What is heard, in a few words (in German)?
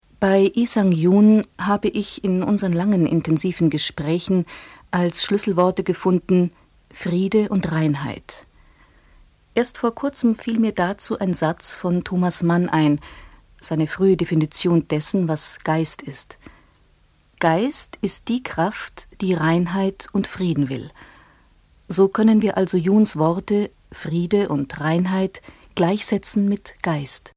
Musik